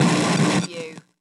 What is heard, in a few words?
Electric Interference